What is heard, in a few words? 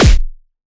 Anime Super Punch